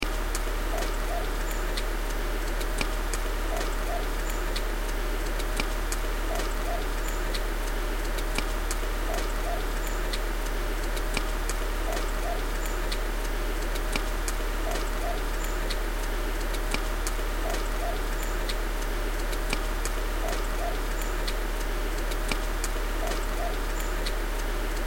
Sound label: rhythm